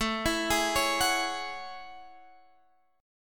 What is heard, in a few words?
A Major 13th, Guitar, Drop D; chord